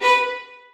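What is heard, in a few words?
2nd violins staccato a#3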